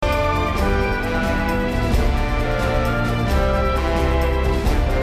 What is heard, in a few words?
Game Tune